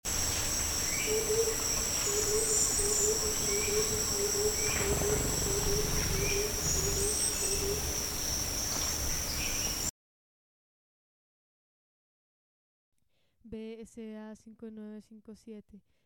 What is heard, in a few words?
gilded barbet